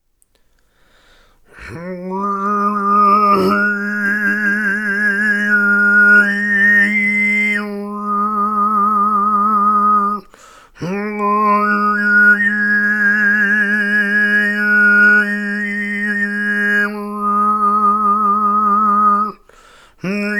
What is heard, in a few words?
Diphonic